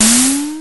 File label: Snare, Drumfire DF-500